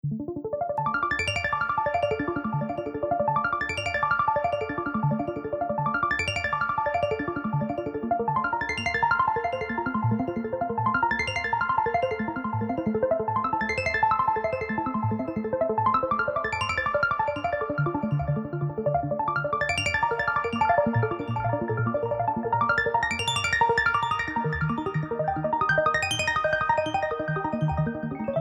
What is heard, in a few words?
Intense Focus